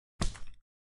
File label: angel step; Footsteps; Barotraumatic